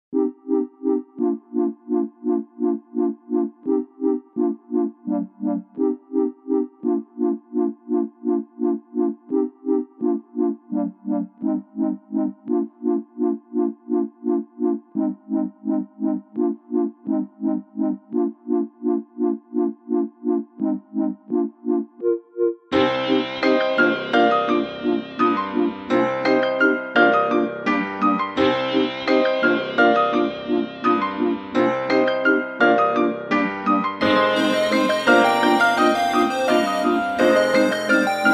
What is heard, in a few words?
chill beats 70; Soothing Soul Easy Evenings